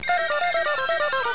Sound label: breathless; beeps